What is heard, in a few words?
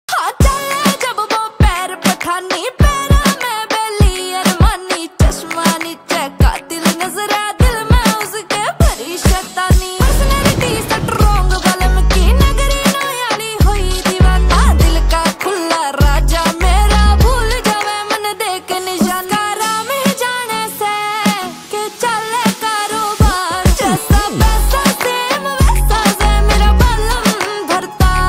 haryanvi ringtone